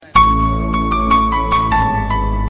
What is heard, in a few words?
Cute Sms